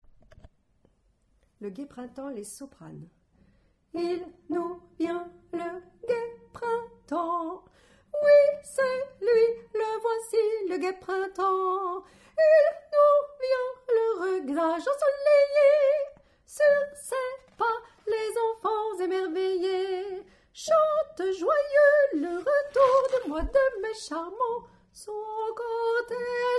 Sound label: fanfare Soprano